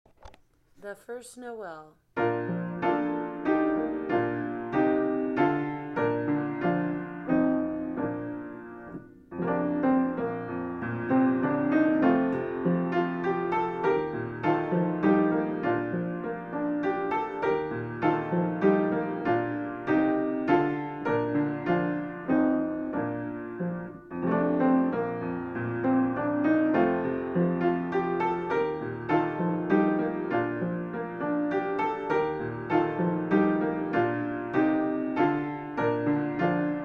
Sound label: the first noel piano